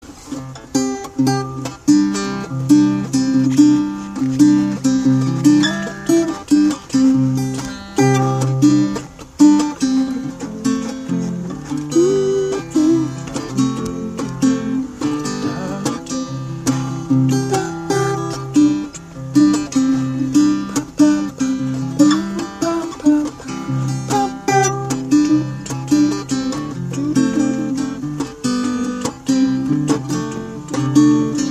clickety clack train track doodle silvertone parlor